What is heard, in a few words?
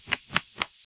hovering your mouse over, card